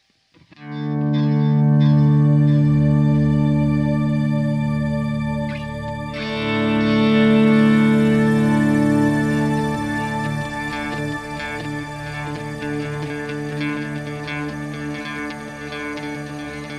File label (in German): EQD Silos Delay Digital Kontext Auto Swell Shimmer Reverb